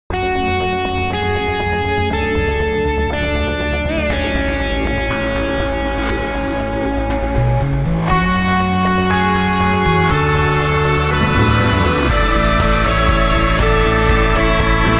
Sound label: Rock